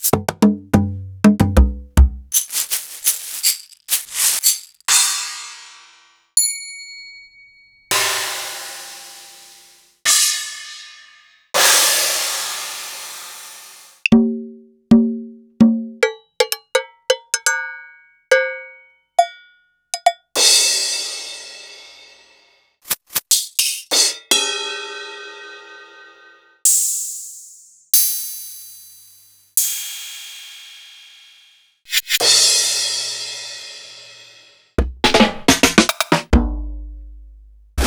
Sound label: EMU Orbit